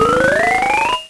hippo; Treasure Galaxy (1994); Learning Company

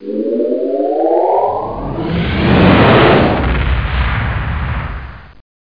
warp; trekdemo

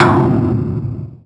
drm, impact metal

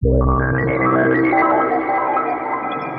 D+B 160; eJay

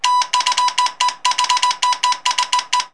Morse Code Send; Wild and Wacky Sound Software; 1,000 Sounds; Combat; Other Military Stuff

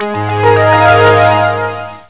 bonus

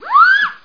siren wh

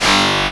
pickup

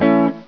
A Chop; Magnet 1.0b27

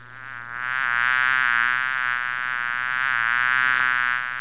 BEE; animwave

